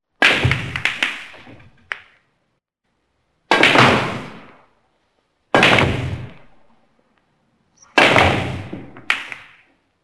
vylet v igre v snuker

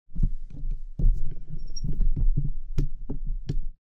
mikrofon ustanavlivajut na stojku

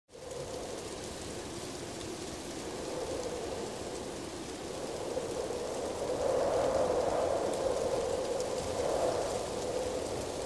holodnyj svistjashhij veter i nebolshoj dozhd; Звук ветра и дождя

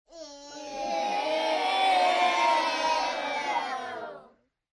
vozglas razocharovanija

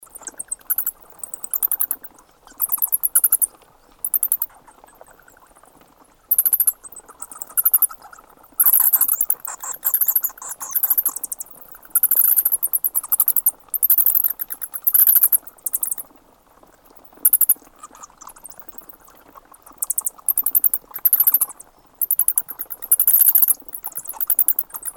pisk letuchih myshej

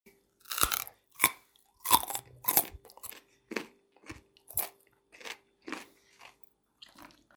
kusanie i zhevanie morkovki; Звуки откусывания

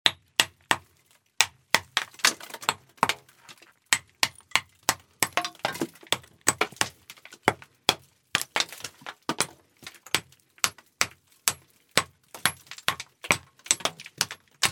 razbivajut kamni osyp

